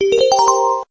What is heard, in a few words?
gong; rozklad; zapowiedzi